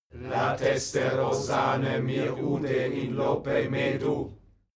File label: male crowd evoke